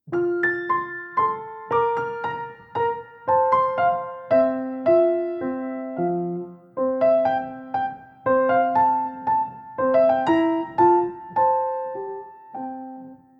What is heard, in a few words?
Menuett in F KV 2